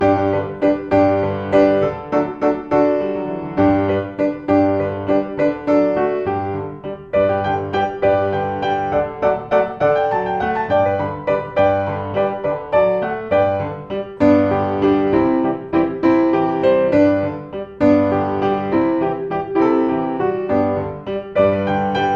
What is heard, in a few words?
Waltz; Songs Waltzes